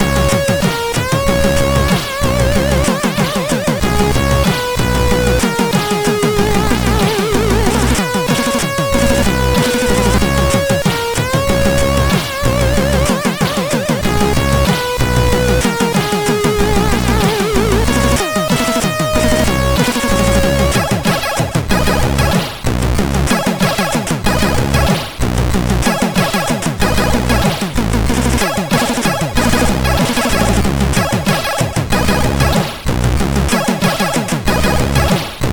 Brown Ice, CSG8580R5

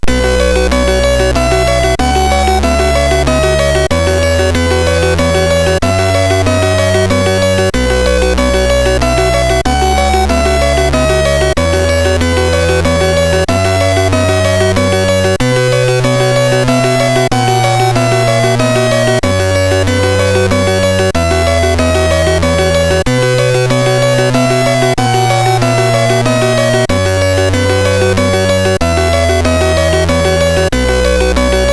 Hip Hop, sid MOS6581R3; Mermaid; Early Tunes

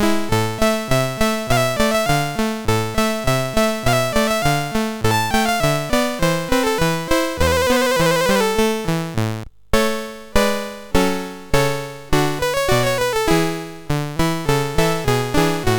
MOS6581R3, GAMES; Waterline

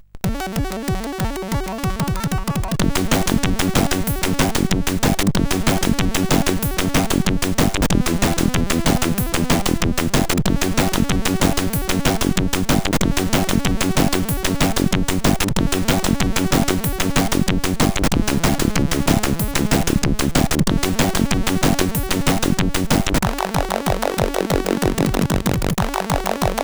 sid, CSG8580

